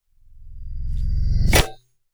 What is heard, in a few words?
Science Fiction Magnet Attract Metal Impact Super Power; Electrical; SFX Bible